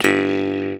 MT G, JHARP G B; Xsample VOL-9

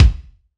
BD, BASS DRUMS; EdgeSounds

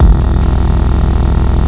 FXBEAT

